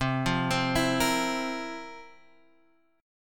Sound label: F Major 7th Suspended 4th C